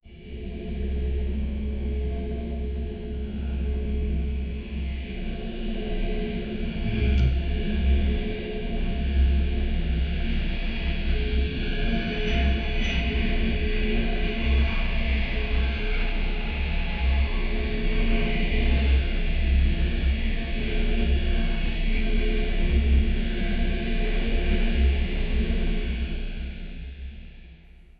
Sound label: cold and dark diesel angi